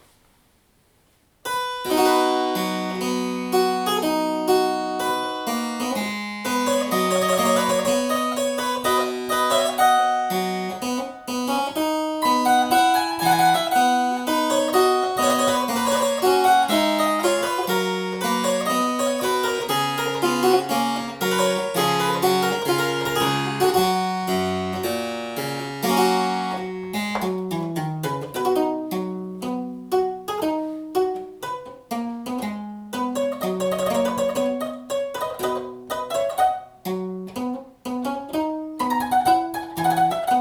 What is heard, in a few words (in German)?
Spinett; Sassmann